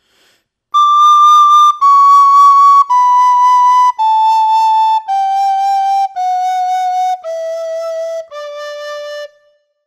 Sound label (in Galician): vibra sopro, Pito Galego; afinacion